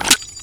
ar2 reload push, weapons

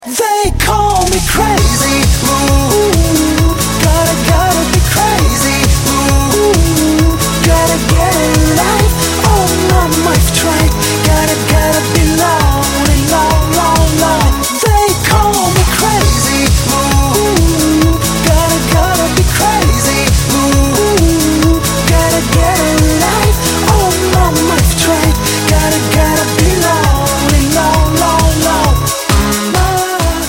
loop; css

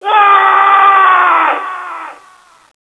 scream

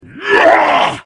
attack; slender; moarg

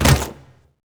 pig attack hit; slender; amachineforpigs

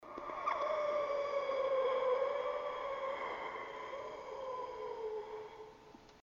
angry; csgo; eternity; scp; 966

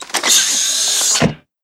delorean door close; vehicles

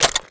rail charge empty

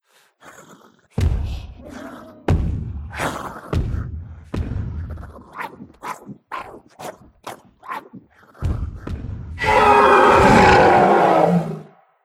emote dino complete; csgo; fortnite emotes

